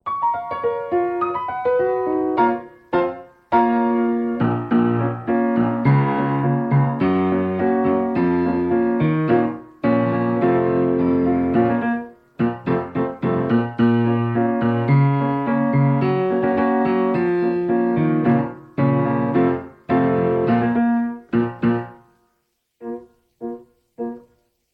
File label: instrumental